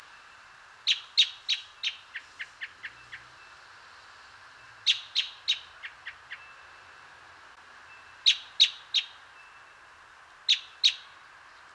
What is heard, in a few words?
American Robin Turdus migratorius